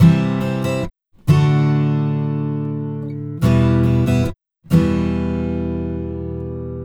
Acoustic Pop Guitar 128BPM Emin; 140BPM; Vengeance - Essential Clubsounds Vol.4

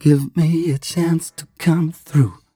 m 129 mel e, Vocals

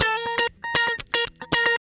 Guitar Licks

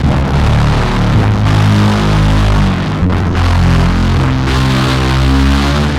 Dark Bass, Drum N Bass; Sonic Foundry (Sony Creative Software); Knutrix Drum & Bass

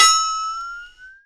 percussion special

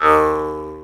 Jaw Harp Oo; Planet Earth; Digital Sound Factory Planet Earth

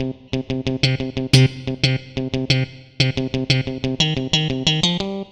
Funky Guitar, Guitar Synths; Dr. Fink's Funk Factory

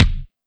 Kick, Yamaha DD-20

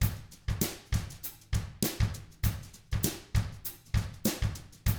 FUNKY, Drums

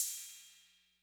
Cymbal Accent Min Decay, 808

